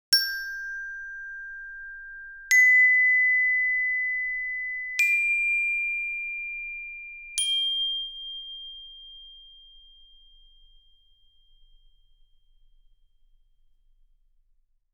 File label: glockenspiel